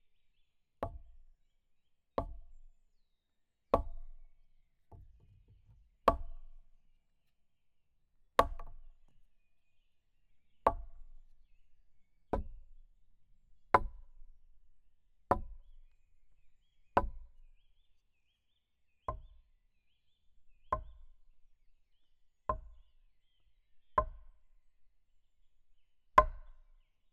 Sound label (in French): Gourdin sur buches serie x23; Percu Bois